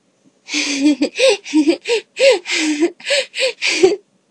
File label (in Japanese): ふふふふふふ、ふふっ、ふふふふふふ